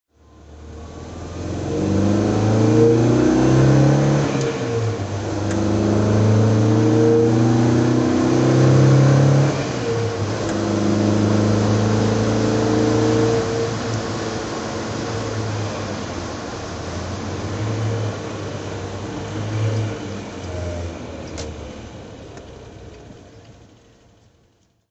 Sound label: volvo p1800s b20 drive